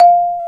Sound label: Bass Marimba